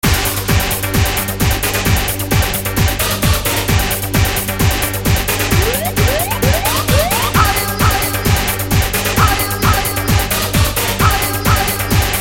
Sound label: proto techno